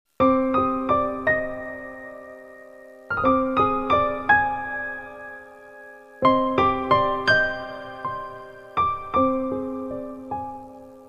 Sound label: azeri instrumental